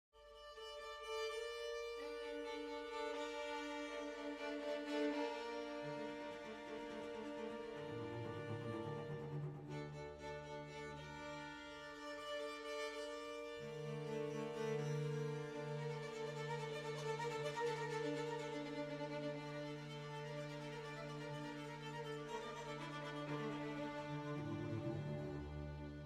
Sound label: VIOLA AMORE